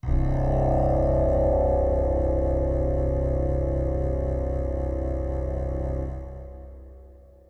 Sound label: SYNTH PAD AMBIENT 160 C, Ambient; Sphere